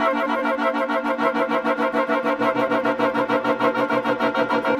Winds, Orchestral; Sonic Foundry (Sony Creative Software); Orchestral VOL-2; Modern 22

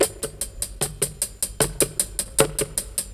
HAT, HI HAT LOOPS, Dub; Drumdrops In Dub VOL-1

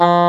ENG HORN F3, WIND

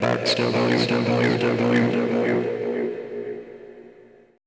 FX VOCAL

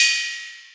Cymbals, Elektron MachineDrum

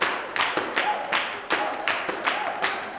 160 TAMB BRK; Blues & Soul

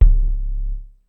Muff Kick, Ensoniq ASR-X Pro

